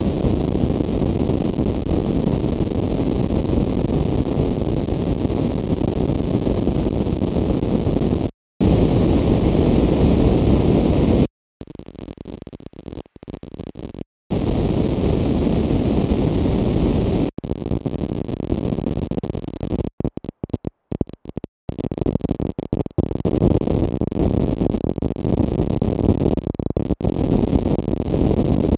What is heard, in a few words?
crackle